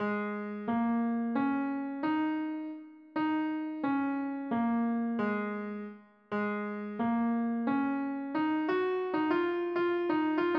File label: rhythm